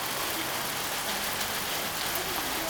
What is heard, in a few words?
Chiang Mai downpour